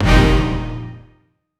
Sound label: fast orchestral hit x4